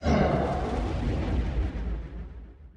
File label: recall start; smite; osiris